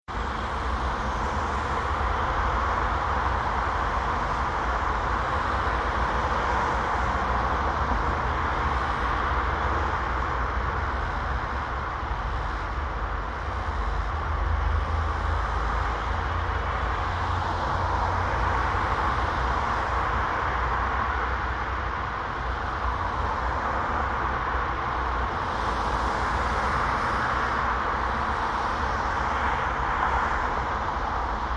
Europe Spain City Heavy Traffic Intersection Horns Cars Trucks Motorbikes; Tom Hutchings